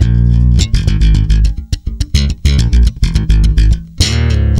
A#, tweaky, bass; Spectrasonics; Bass Legends

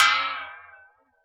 BOX FX; Univers Sons - Raricussions

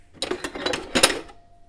fooley; M8; percussion and foley

